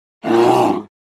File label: Bear Grizzly Growl